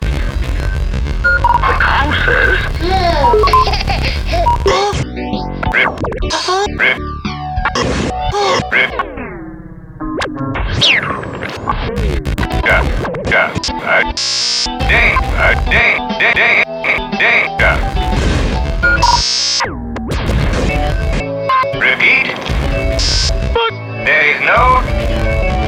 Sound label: crystal distortion